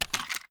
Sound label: ar xmag reload fast mag out, weapons; gmod; viper